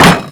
knife wood; phy knife wood

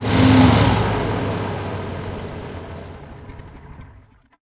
t64a engine load, tanks; War Thunder

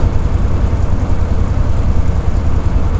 bearcat cockpit r, engines; War Thunder

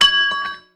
cannon shell drop; War Thunder

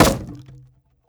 wood; Rust

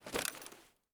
chemlight throw; Escape from Tarkov

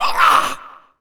hurt heavy

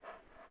Animal Breath Large; Civ4 warlords

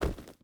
footstep rubber boots forest jump land; Rust

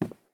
walk wood; Escape from Tarkov

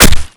Projectile Sounds; Red Orchestra Ostfront 41-45

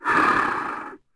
Bear Heal Vox; Civ4 warlords

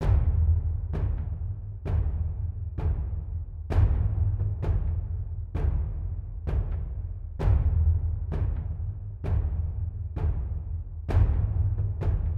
music interactive; World of Tanks

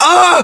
pain; english

